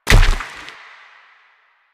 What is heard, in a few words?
Proto Infested Runner Step Default; Warframe